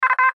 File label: Radio Morse Code; Morse Code Letter U; Morse Code (Sound Effects:Electronics); Blastwave; Warfare